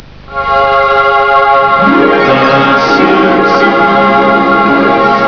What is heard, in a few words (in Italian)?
Sigla cartone; SIMPSON; homer